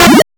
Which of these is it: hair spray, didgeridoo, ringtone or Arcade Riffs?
Arcade Riffs